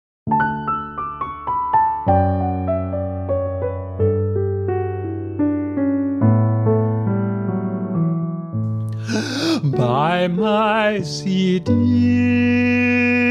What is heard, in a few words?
jingle; comedy